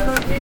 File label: cash register